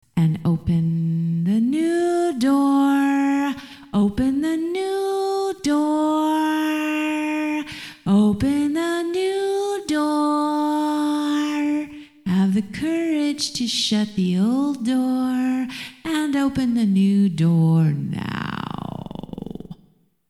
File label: new door